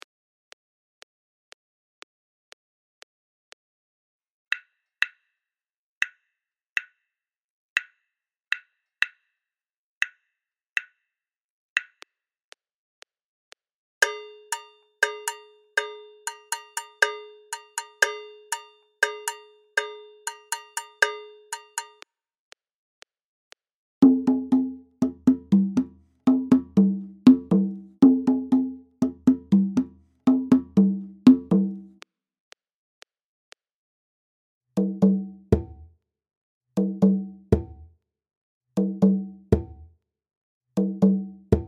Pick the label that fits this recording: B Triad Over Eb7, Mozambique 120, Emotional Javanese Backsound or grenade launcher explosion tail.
Mozambique 120